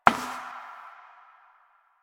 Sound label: Step Perforated Metal; Warframe; Koumei